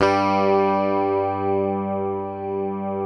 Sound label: PICKED, Bass; G2; Sampleheads; Will Lee Bass Library